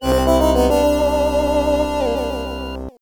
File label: Death Jingle; Hellfire Saga